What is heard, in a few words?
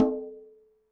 conga, latin